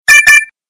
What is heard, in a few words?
Tone, Message; i-mobile; ZAA-1